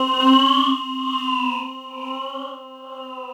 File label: SYN, Drum & Bass, 130 BPM; Ueberschall; Drum & Bass Flash Trackz